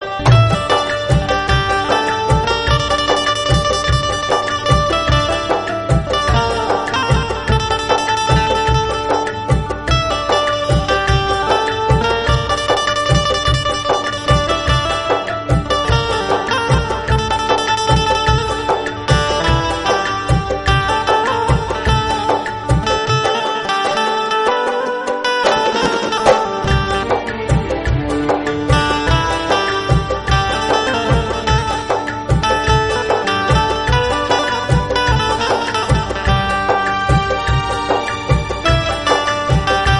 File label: instrumental ringtone